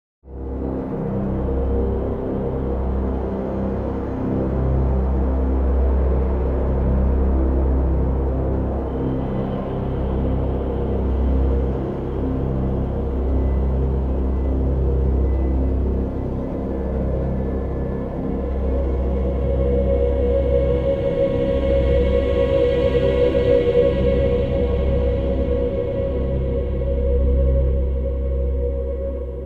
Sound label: BLACK MONOLITH DRONE